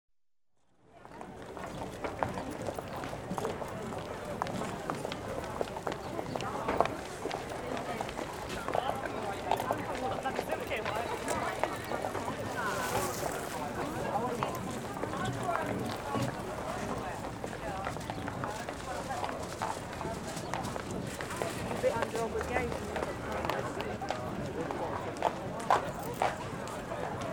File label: Market Place, walking through; The BBC Sound Effects Library